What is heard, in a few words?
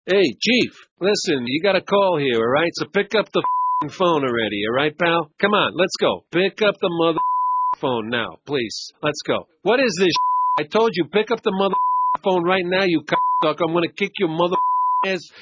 Bleep Generic Ringtone